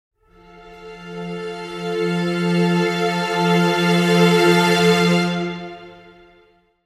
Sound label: swelling string chord